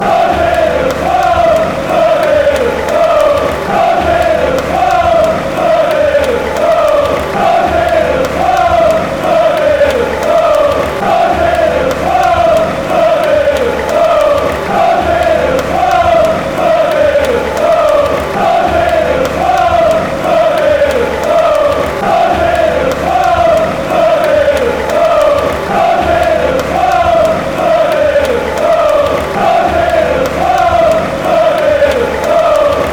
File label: az chant